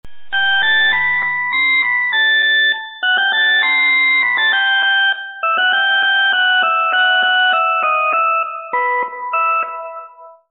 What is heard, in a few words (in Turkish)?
zil sesi